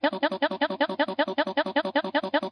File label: Beeps; Malone